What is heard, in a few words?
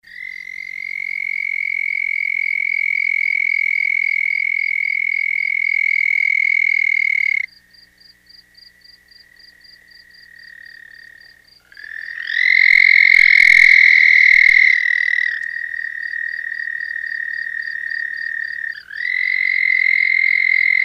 Red Spotted Toad